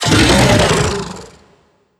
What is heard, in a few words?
beast vocal angry growl